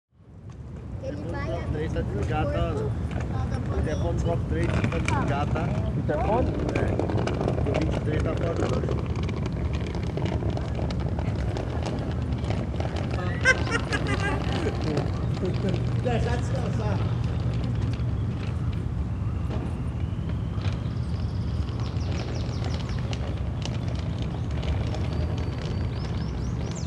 ambiances du risque presence humaine

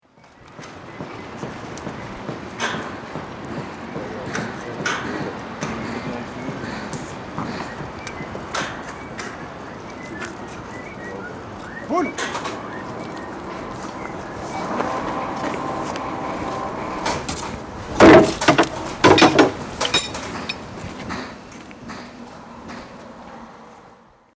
chantier sifflement ambiance; Culture sonore

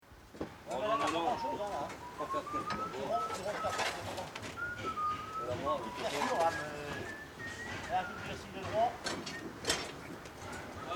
ajustement marteau sifflement, chantier; Culture sonore

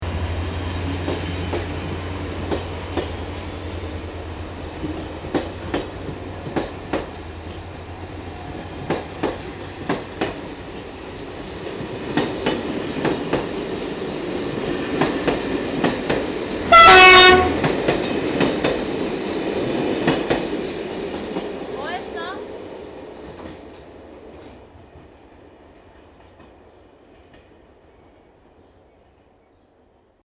accelerando train gieres

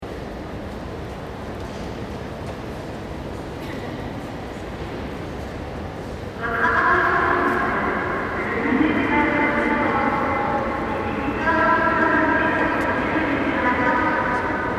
reverberation voute; ORSAY

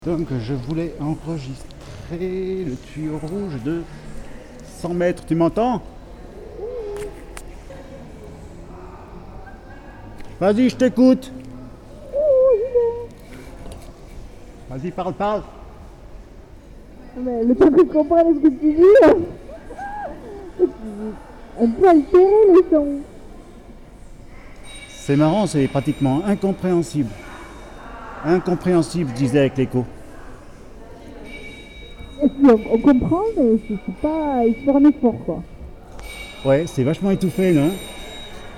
filtrage tuyau

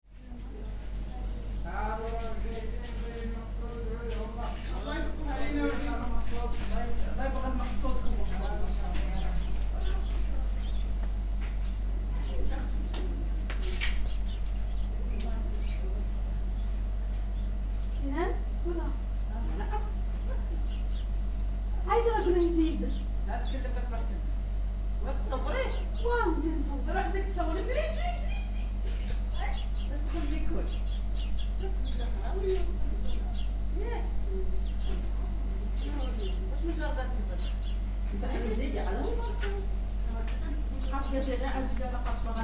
kenadsa femmes; resonances oasiennes

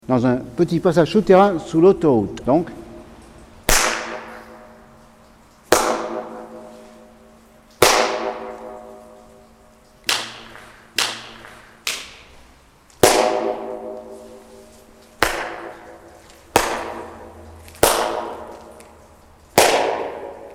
echo flottant; HLK kontula

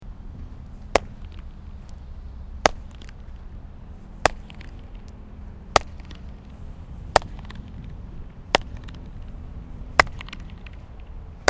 echo flottant Barcelonne circulaire

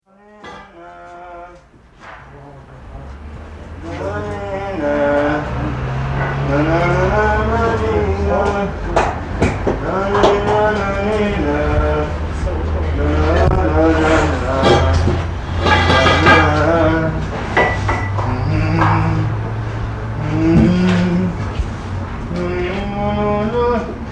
chant effort, chantier; Culture sonore

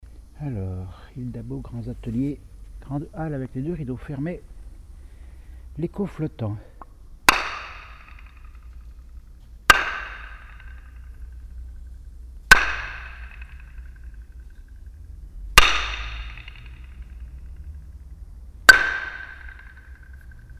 FX echo flottant; GAIA

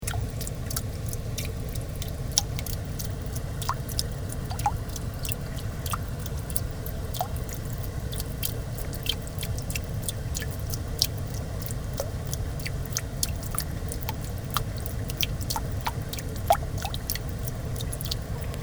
gouttes

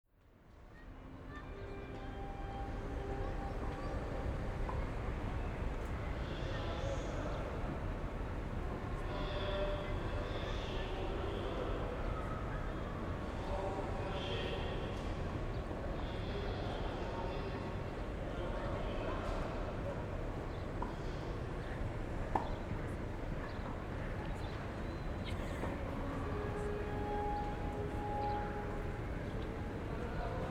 portee ferroviaire tennis voies

